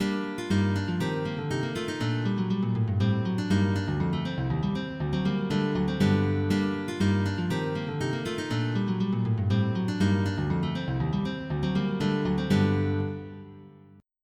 Prelude; P Roseter

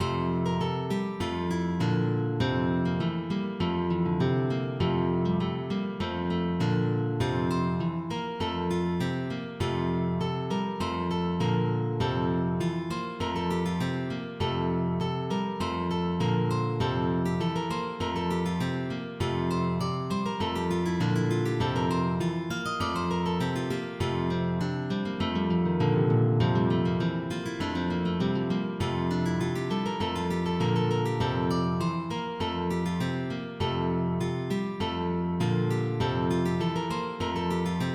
duet; Dump

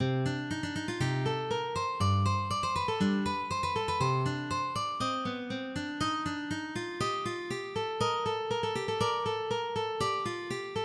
Ricercar, MIDI

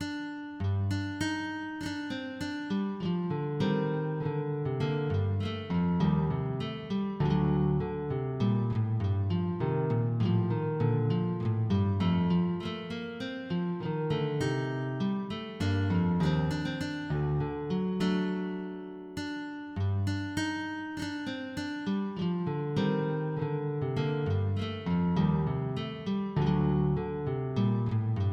Gigue, MIDI; de Mr Emond

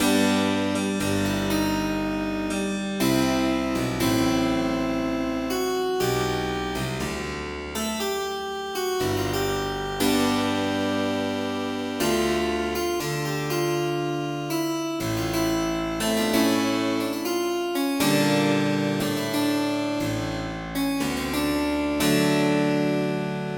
Sarabande, MIDI

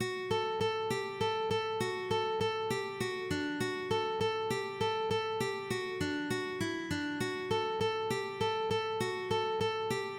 MIDI; Old Man Rocking the Cradle

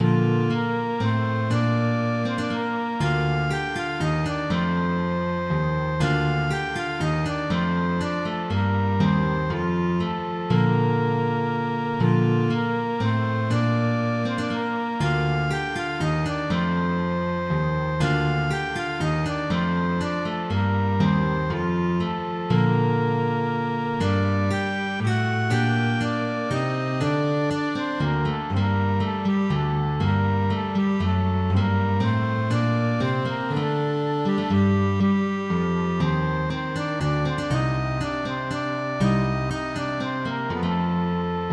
Menuet

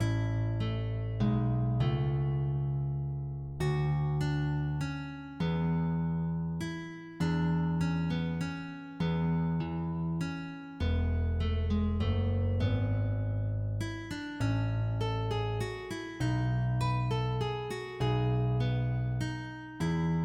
Menuet

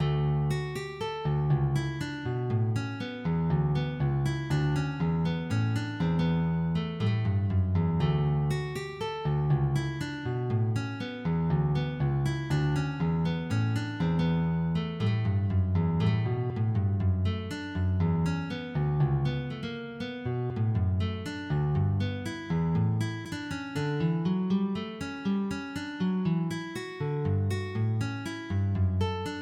Gavotte

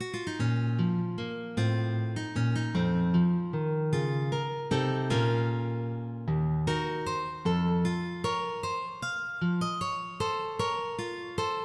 Courante; Gauthier

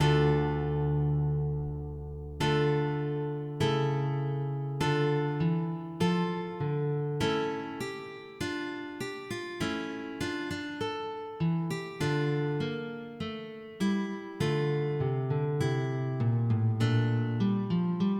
Prelude, MIDI; Jacob

